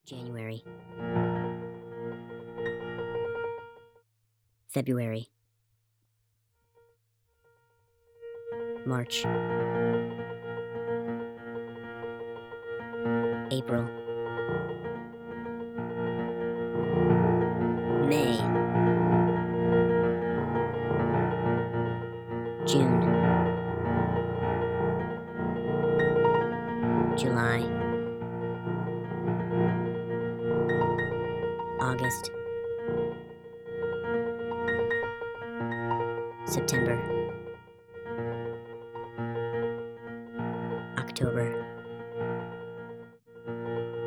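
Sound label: Piano Sonification; CE C02 Flux net Flux; 10x Rate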